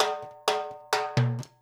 FILL, DANZON, Percussion; InVision Interactive; Percussion Slam

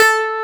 digi decay; AKAI S6000 CD-ROM - Volume 1; SYNTHESIZER

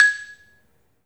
3D MARIMBA; 3D Sound; 3D Keys VOL-1

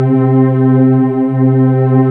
PAD, Synth Pads; SYN-PADs; Synth-Pads 5

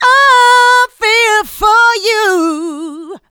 mixed vocals; Houseworx

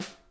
snare, drum kits

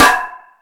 All Snares, E-MU Pro-Cussion

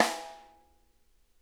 rim mf R, snare; Sonic Reality; Interactive Drum Kits

